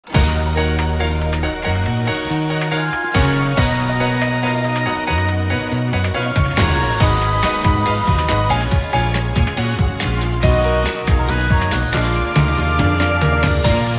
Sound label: Raindrop Memories Instrumental; tokyo mirage sessions fe